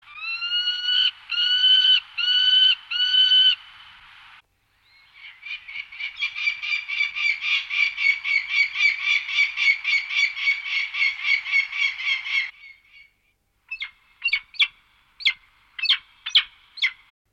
Halcon peregrino

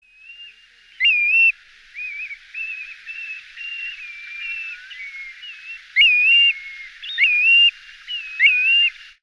Chorlitejo gris, pajaros

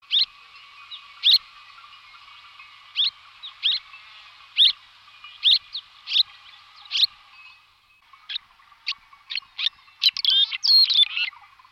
Golondrina daurica, pajaros

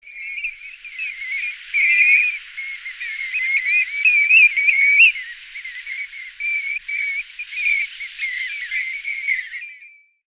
Chorlitejo dorado

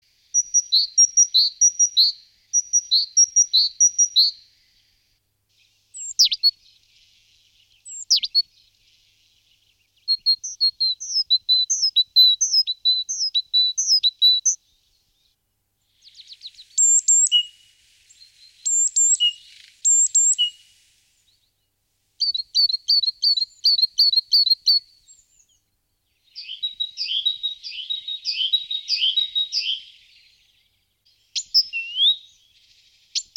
Carbonero comun, pajaros

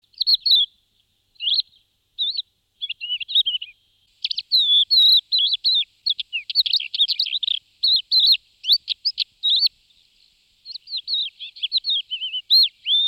Cogujada comun, pajaros

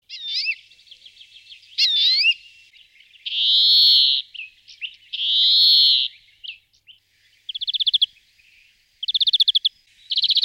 Verderon comun, pajaros

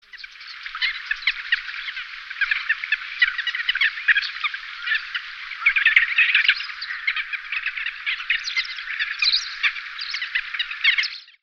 Aguja colinegra, pajaros

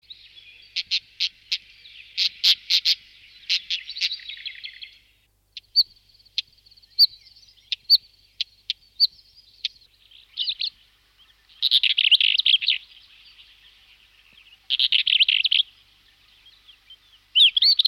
Collalba rubia, pajaros

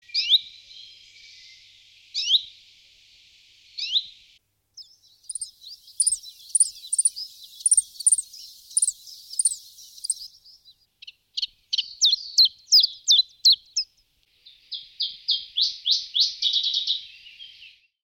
Mosquitero comun

